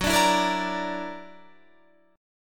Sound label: F sharp Augmented 7th F sharp, chord